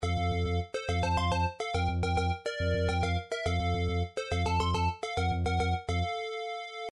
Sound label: intro fanfare; nintendo xevious